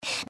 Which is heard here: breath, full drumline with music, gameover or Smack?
breath